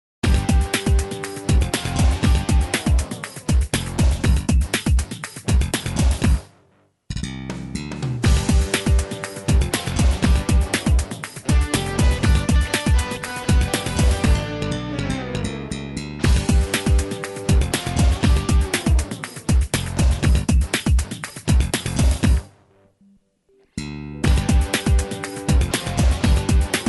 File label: Horse Racing Guitar